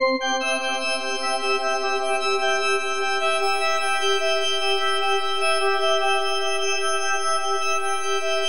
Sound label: FM C MAJ; Bolder Sounds